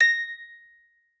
musicbox

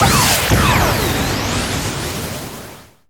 SE GAME SLIDE; maimai

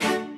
strings

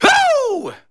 SE GAME BREAK; maimai

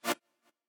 chord

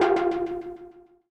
synth

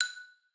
xylo

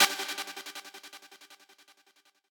lead

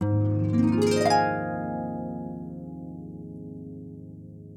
harp

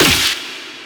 snare

